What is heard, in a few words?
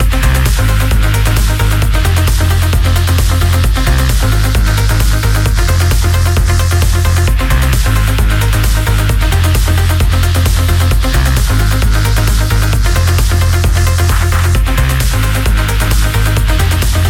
twilight mode